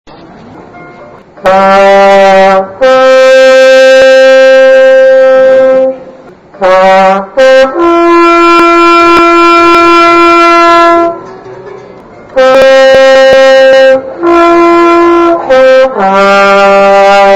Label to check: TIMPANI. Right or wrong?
Wrong